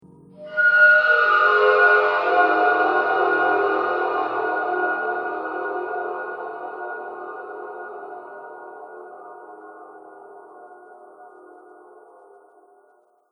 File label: Slasher Horror Transition